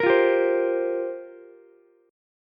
F Suspended 2nd Suspended 4th down Ukulele, Fsus2sus4 chord; strummed; JGuitar